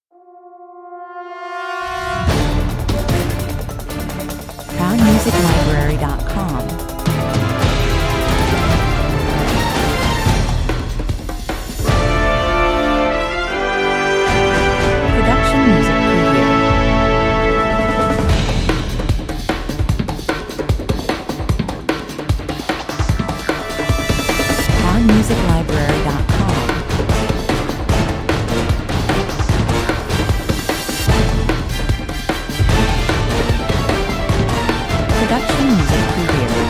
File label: steel claws 150 bpm